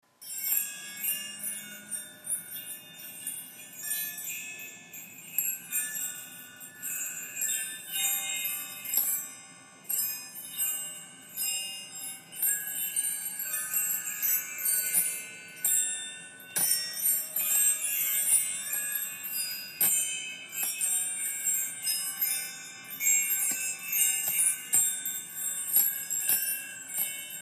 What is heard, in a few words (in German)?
Kosmos Klangkugel